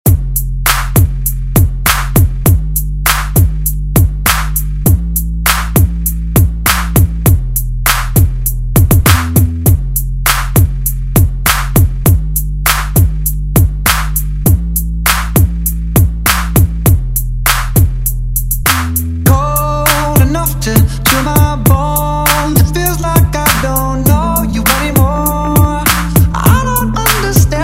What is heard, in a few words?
Club Redrum